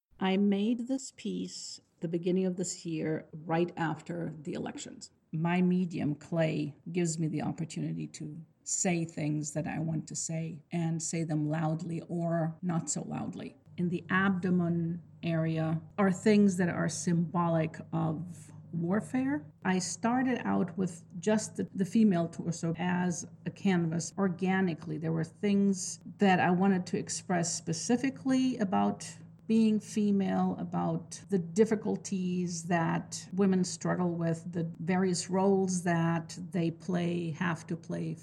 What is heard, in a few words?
PRIMAL SCREAM